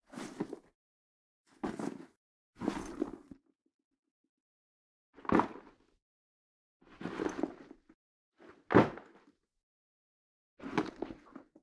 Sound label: backpack pick up & put down; human; NS Studios - ultra sound collection